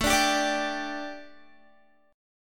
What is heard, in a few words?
A 7th Suspended 4th, A, down, Guitar